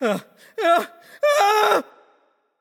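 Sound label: nonverbal fear; voicepack; smite; merlin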